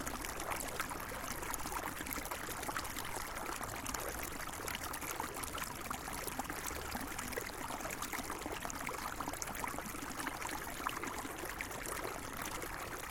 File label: burst underground spring emerging through road following heavy rain; in hope valley